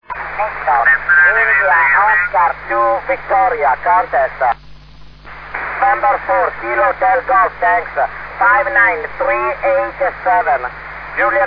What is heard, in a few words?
VHF; IO2V; IARU